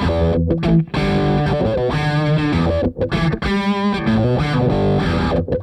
DRIVER, Funk Guitar; 90 s Sample CDs; Zero G

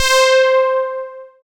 SYN PROPHE, Analog; Sound & Vision - Gigapack I CD 2 (Roland)

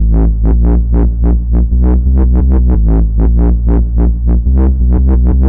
BASSLP, Bass Loops; neuro; Stanza

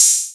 Open 808 Hat; Digital Sound Factory

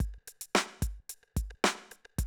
110, Classic Drum Loops

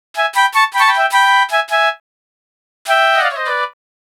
Flutes & Swingers Flutes E; Zero-G Kocktail Kollection; Construction Kitz (Lounge); Flutes & Swingers 156

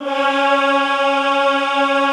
EHH C#3, Classical Choir; Best Service

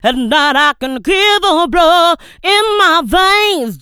AND BLOW, Funky Vocals, HOOKS; USB Soundscan vol.18; AKAI

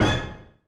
Dance Kit, Korg 01W

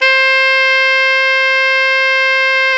SAX TENOR, Super Sax Tenor mf; Roland LCDP07; Tenor mf&ff

